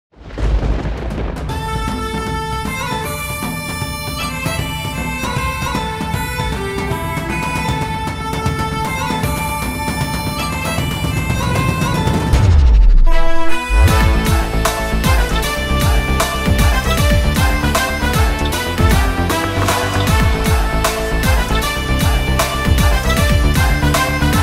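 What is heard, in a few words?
Ringtone Mass background music; DEVARA; NTR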